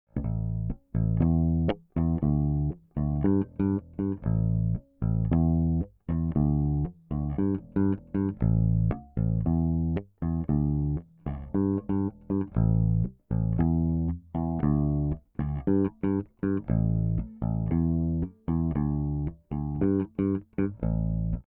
grave aigu doigts, bass; smpro

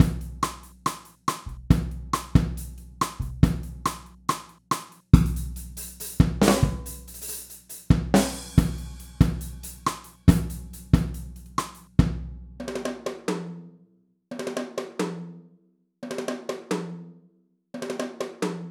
batterie dry; cakewalk sonar8

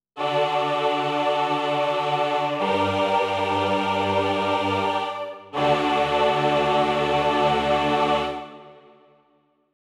son choirs, korg m50

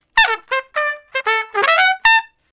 trompette pitch; celemony melodyne

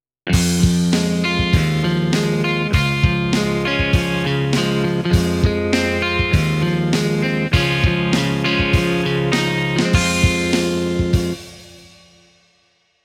son eguitar, korg m50

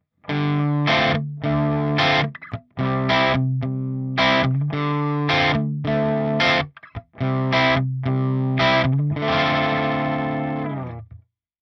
crunch chevalet, gretsch

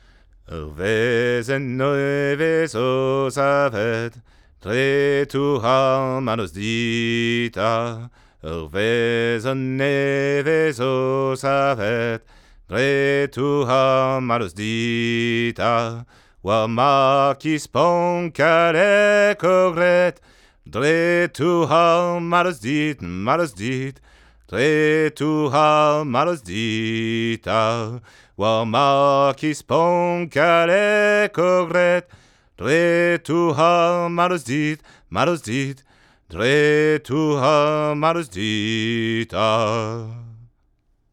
chant comp; pendulum; quartet

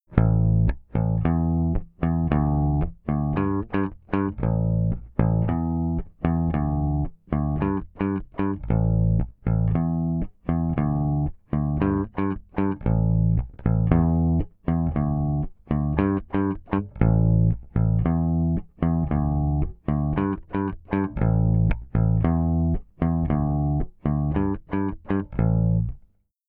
compresseur rapide gain a fond mediator, bass; smpro

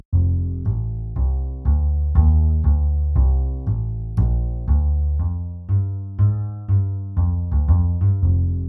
contrebasse; eastwest colossus

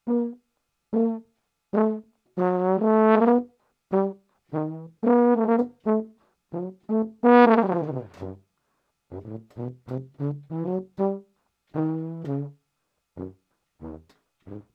baryton; audiotechnica ae2500

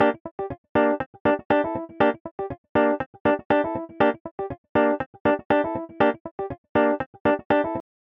guitare funk; eastwest colossus

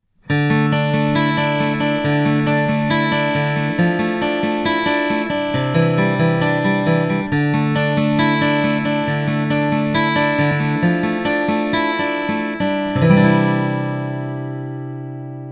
sputnik arpeges; m-audio sputnik

